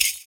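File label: PERC, percussions; Univers Sons - Raricussions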